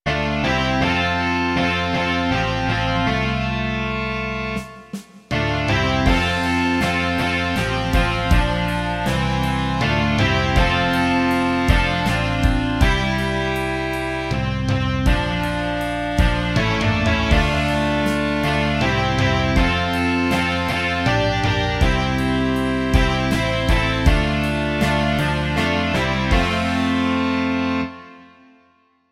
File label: rock